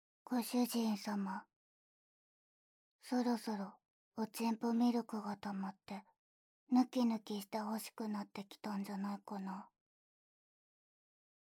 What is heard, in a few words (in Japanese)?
お耳をぺろぺろしてあげるね; おまけ『お耳をぺろぺろしてあげるね』